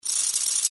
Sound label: Win Chip